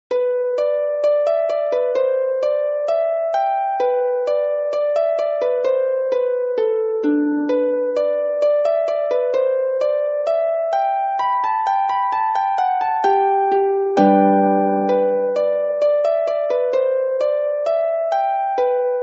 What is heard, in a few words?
G String, Welsh Style Tunes